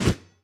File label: whoosh